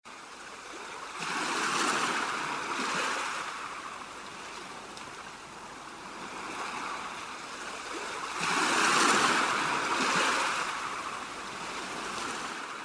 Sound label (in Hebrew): רחש גלי ים